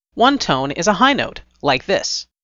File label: Tone Target